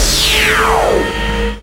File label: attack